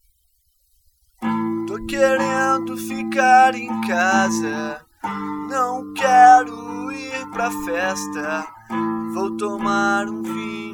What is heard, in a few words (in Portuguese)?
guitarra